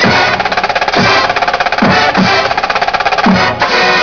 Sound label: Day Danse- Spotless roll with the horn hits.; SPOTLESS!!!!! Did I mention spotless? :)